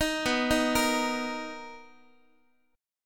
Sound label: B Major 7th B, Guitar; BM7; arpeggiated; x,x,6,9,7,9; 4A,4E,5A,5D,5G#,6C#